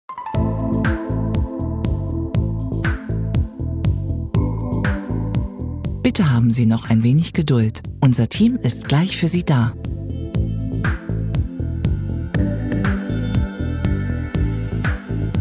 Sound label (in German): Warteschleife